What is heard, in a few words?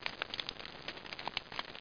Torches